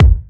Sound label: kick, Heartbeats